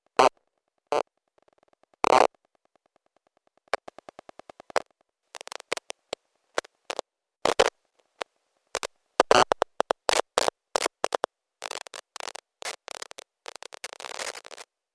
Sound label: WiFi Data PC Laptop